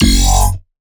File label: Dirty Bass F#, Bass Hits; One-Shots; Ghosthack; Color Bass Essentials